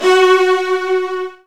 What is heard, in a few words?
F#2, Virtuoso Strings; ILIO; Kirk Hunter Virtuoso Strings VOL-2